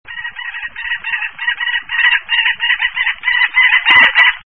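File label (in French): Faucon Gerfaut, Rapaces; Les Falconidés; Faucons; Oisellerie Galan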